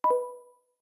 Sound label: hulahoops lock, ui; LG L18VC Classic US Celular